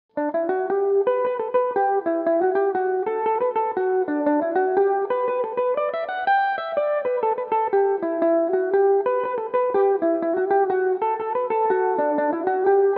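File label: G major belmuse, irish mandolin; Get Up Old Woman And Shake Yourself; Belmuse EM-190 electric mandolin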